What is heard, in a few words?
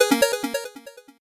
8bit se